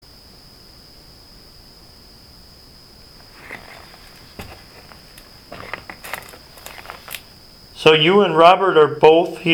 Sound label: Foot Steps In The Barn; EVP